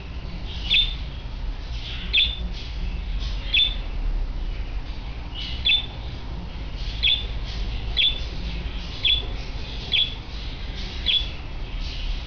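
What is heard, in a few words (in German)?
kontaktrufe jungtier, wellensittich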